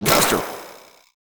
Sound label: faster normal; HeavenStudioPlus; samurai Slice Ntr